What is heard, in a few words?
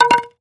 Sfx Baseball